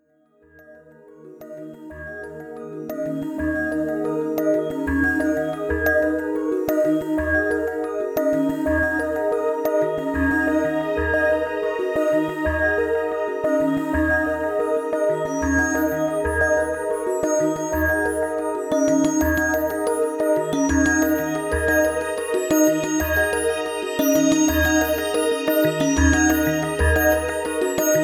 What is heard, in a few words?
Jupiter X Electro Mallet